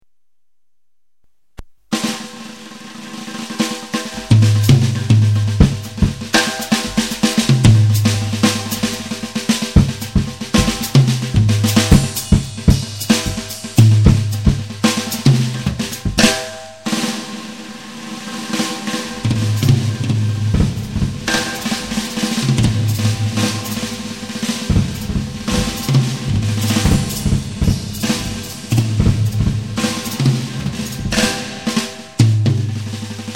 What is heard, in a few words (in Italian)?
ASSOLO; claudiodrum